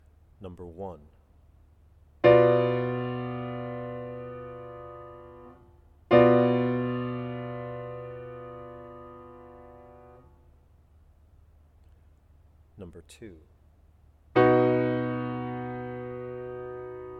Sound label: chords; ear training